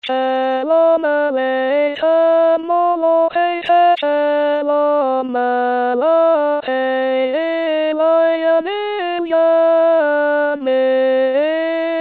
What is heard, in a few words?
Alto; Shalom Aleichem